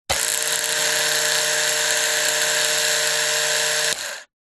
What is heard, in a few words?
household razor electric male motor whirr close up open; remington